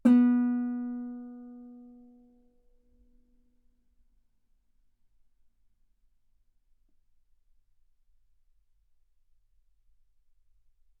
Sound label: Guitar ff sul B B3; single mic